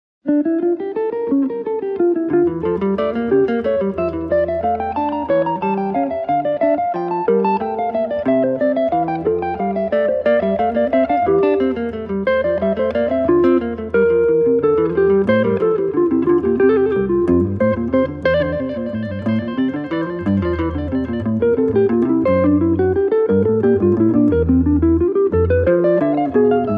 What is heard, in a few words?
Invention No. 4 in D Minor BWV 775